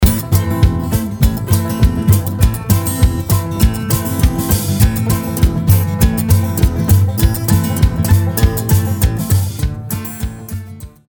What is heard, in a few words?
acoustic blues